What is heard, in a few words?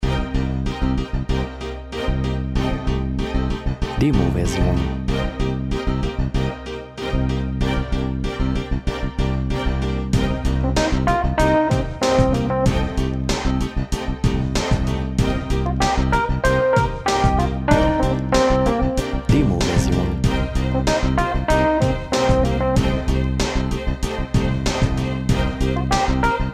Funky